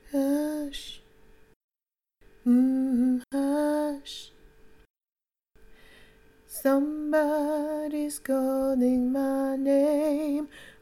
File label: alto